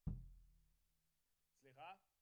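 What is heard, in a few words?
Snare Top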